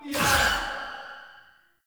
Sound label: Choir Erupt Proj Throw